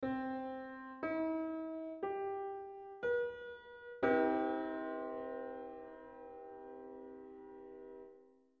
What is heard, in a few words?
Neutral Tetrad on C